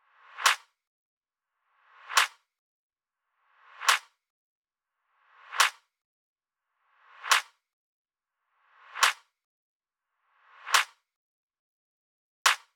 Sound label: clap loop wet; JMG Sound Mirror